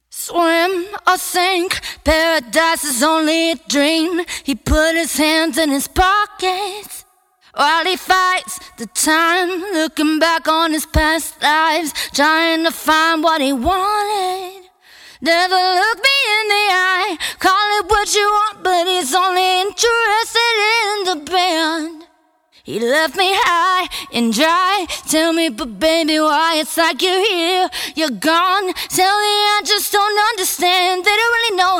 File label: 125BPM Key F